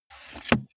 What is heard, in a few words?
car bmw e46 interior sun visor mirror slide